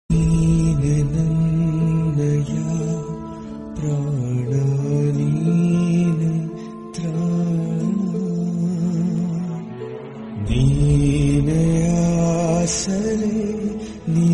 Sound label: radha krishna song kannada